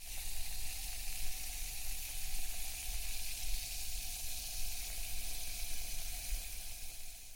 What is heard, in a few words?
Fizzing